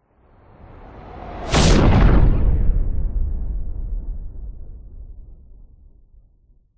science fiction explosion passing spacecraft blow up; zapsplat